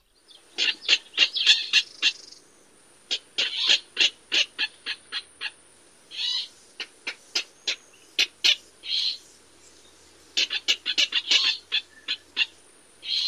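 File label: Virginia rail interaction calls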